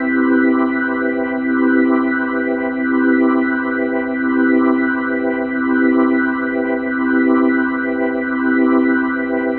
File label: Medical Organ C Maj7; Major 7; The Vitale Organ